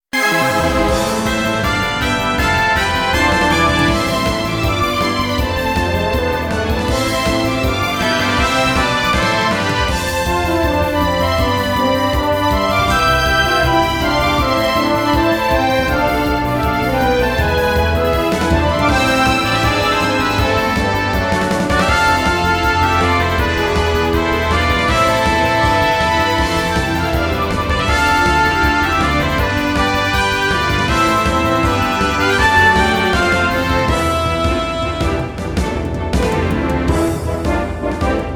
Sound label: battle